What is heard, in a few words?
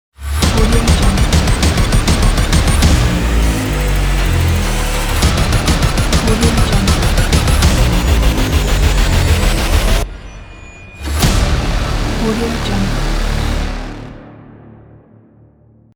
hybrid cinematic teaser logo